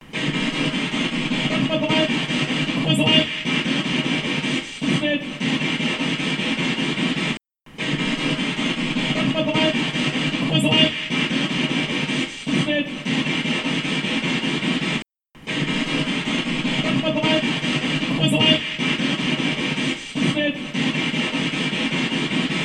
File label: SpiritBox